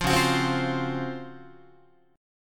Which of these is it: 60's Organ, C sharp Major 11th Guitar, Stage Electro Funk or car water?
C sharp Major 11th Guitar